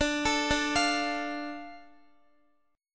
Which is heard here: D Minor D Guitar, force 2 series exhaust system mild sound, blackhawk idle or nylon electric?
D Minor D Guitar